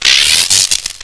die; morocc

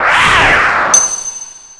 hunter detecting; classicro

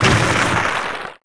stalactic golem die; free 2 play

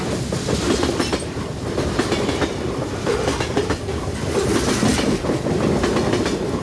train; free 2 play

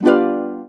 sign down

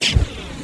attack; kaho; classicro

